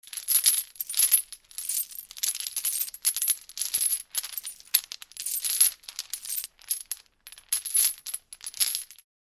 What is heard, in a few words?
Metallic chains rattle and movements